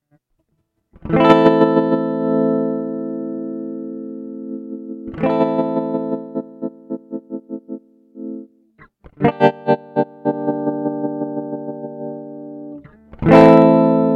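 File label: tremolo; colour theory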